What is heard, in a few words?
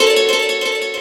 guitar